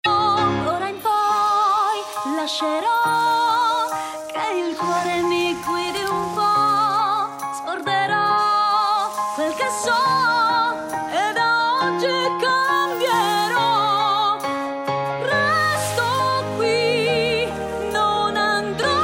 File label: ringtones; frozen